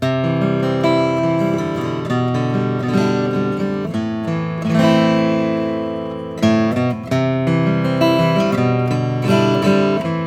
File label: Bourgeois OMS custom 12 fret